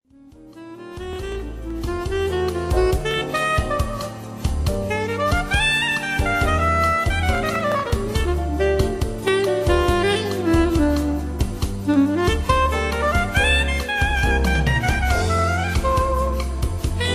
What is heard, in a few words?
Samba